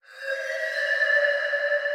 spooky flute

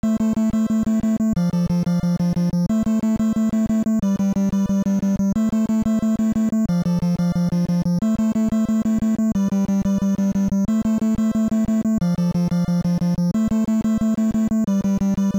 Countdown; super smash bros. ultimate expanded vol. 19 punch out

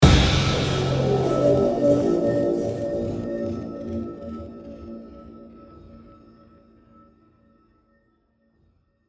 Small Robot High Outro; Horizon Zero Dawn

